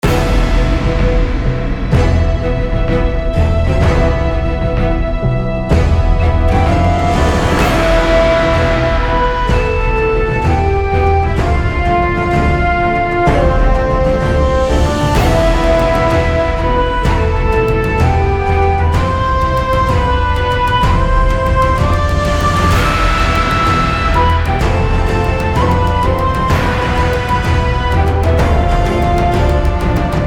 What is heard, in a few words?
Bgm Desert Field